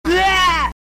yelping; sonic

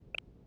click sound; bluearchive

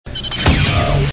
gun; zat

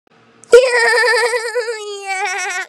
goofy laugh

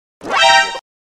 sudden impact; wii

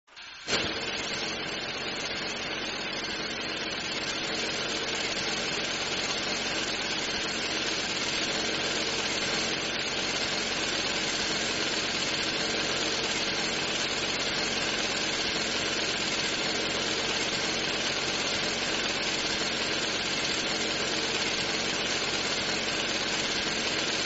drill sound effect

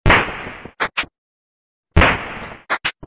rifle

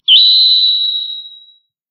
epic twinkle anime

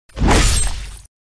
heavy slash

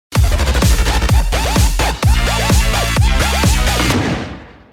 goofy intro type beat

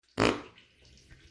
western fart

